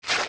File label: sail damage; shipcharge; Pirates Of The Caribbean